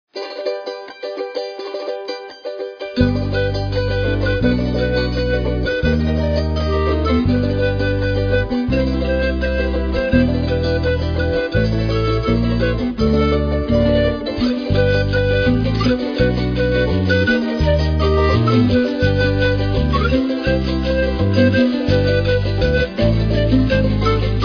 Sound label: Jingle bells, navidad afroandina